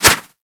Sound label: Melee Swipe; Warframe; Kubrow